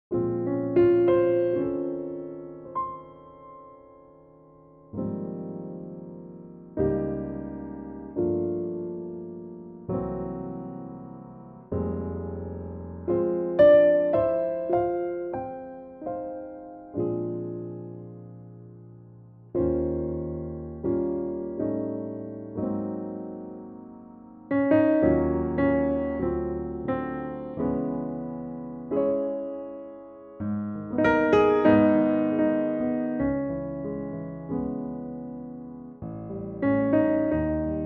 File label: Ab piano